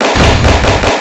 heavy fast clap

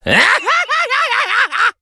evil laugh; Hazama

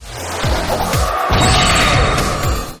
spin slam

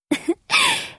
giggle

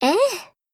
eh

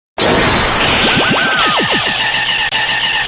ray power; birdman

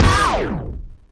rap pose

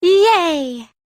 yay

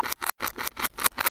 munch backwards; ema

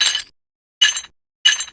head tap

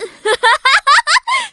laugh

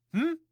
voice hm

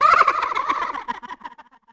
giggle; marx

